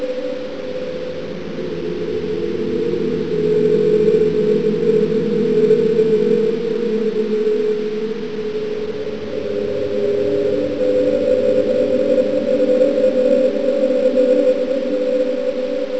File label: atmospheric